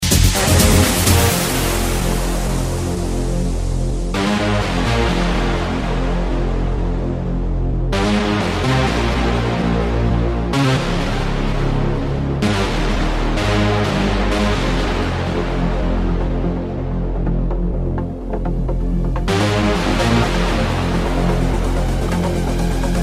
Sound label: progressive techno house